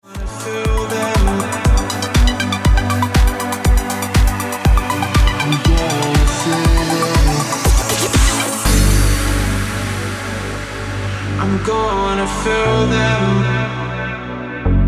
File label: Original Mix Ringtone